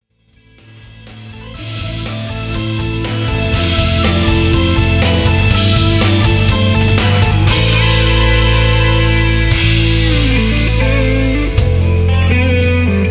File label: Rock Band; Las Vegas